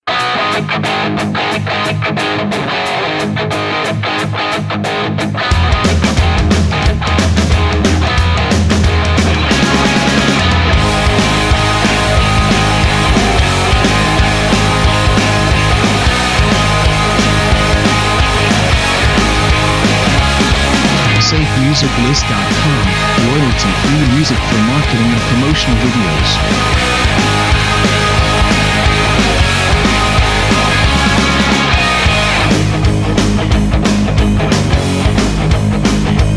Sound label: Rock Party